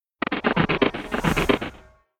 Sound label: Swipe